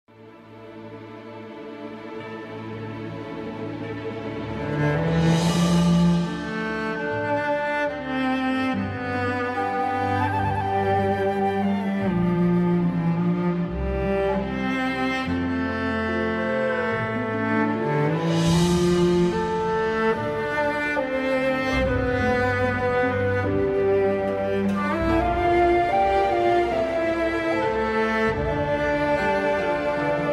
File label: OST BGM INSTRUMENTAL